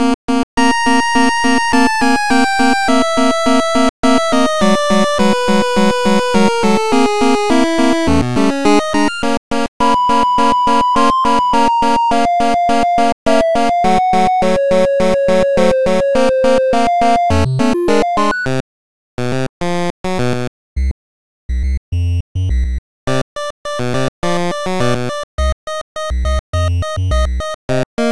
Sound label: 8bit bukimi tou Loop